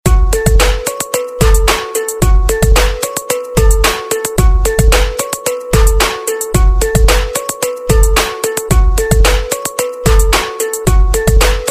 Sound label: Top40; Redrum